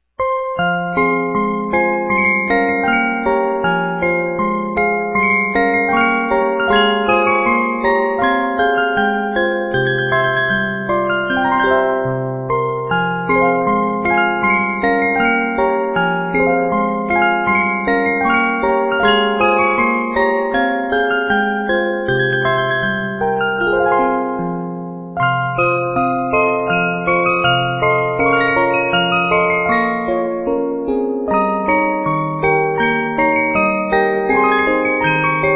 The Golden Wedding 2 Parts, 50 Note music box movement